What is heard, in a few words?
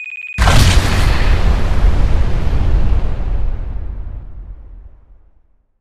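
zvuki bomby, bomb sounds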